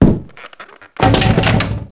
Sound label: wood chair falls; Max Payne